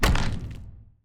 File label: Bone Golem Armor Hit; TANK; 3Souls